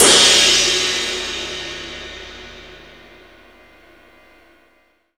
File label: CYM CRASH, DRUMS; USB Soundscan vol.03 - Pure Electro; AKAI